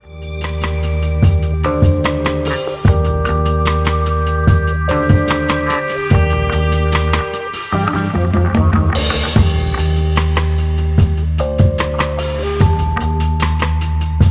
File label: Instrumental, Jazz